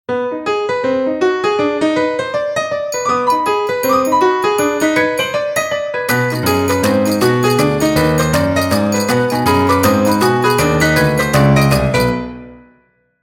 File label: blues piano